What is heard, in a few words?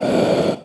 Scuba Diver Breath Water; Playable Tutorial Level; TR3